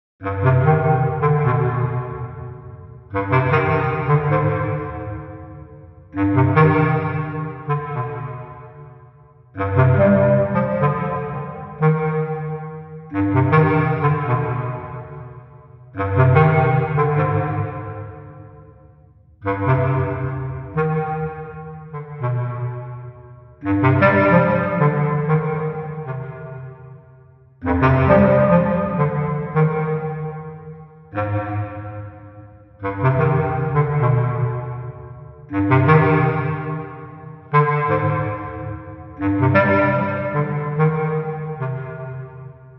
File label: CLARINET B